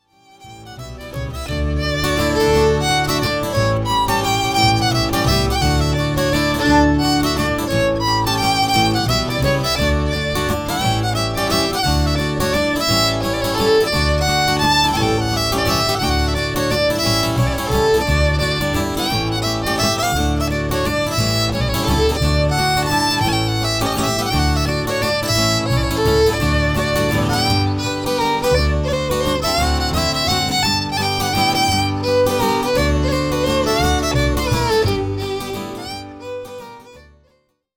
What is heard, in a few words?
Mazurka